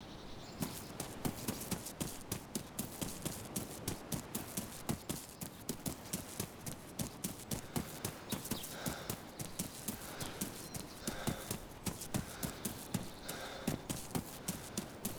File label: running stone; dayz standalone